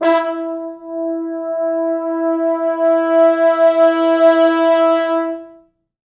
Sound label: 4 FrHorns